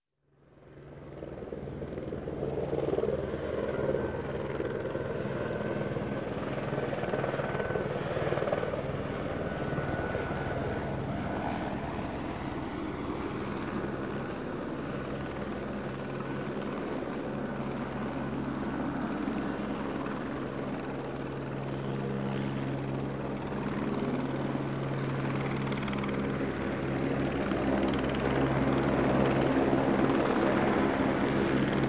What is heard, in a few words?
Helicopter approaching and staying near in air